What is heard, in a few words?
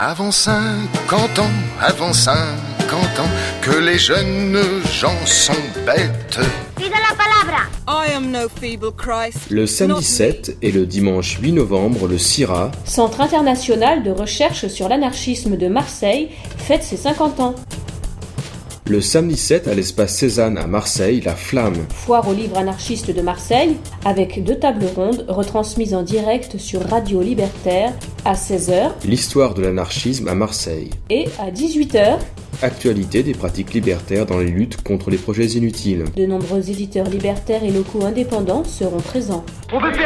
jingle flam